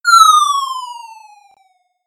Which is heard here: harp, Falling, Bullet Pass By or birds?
Falling